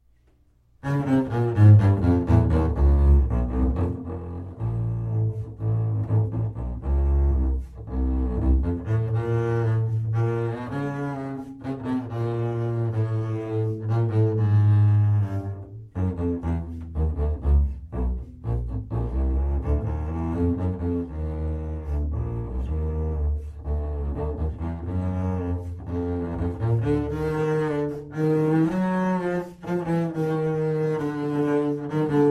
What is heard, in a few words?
Bass; Tchaikovsky